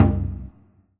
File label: Korg N1R Open Surdo